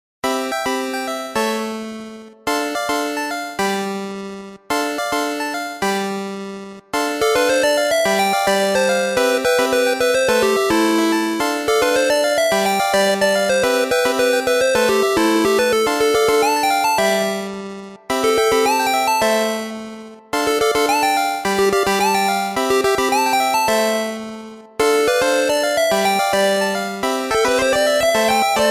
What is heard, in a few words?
8Bit; Steven Universe